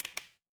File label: glass crack